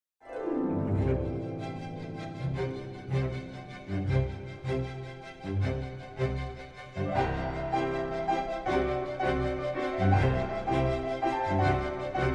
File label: Children Running Around Strings Harp and Piano